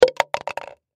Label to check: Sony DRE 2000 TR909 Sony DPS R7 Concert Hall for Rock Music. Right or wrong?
Wrong